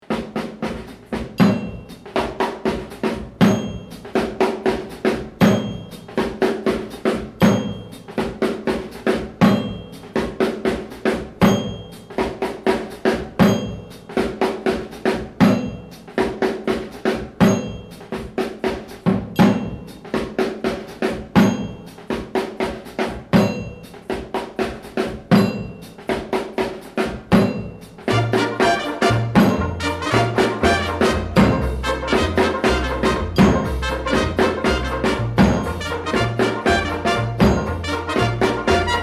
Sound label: Conga; King Conga; Ren & Stimpy - Production Music vol. 3